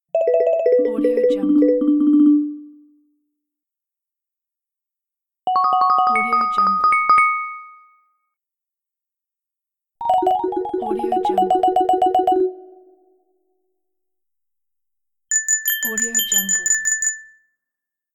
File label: ringers